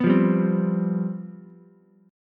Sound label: C Major 7th Suspended 4th, down, Ukulele; strummed